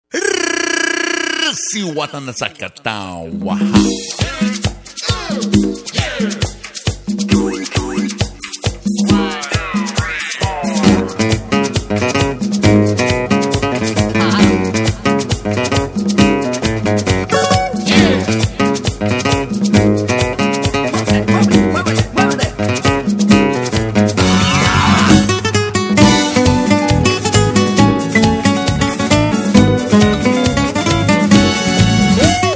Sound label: merengue